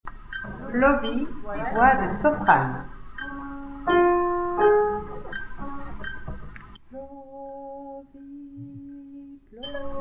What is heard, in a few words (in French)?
Soprane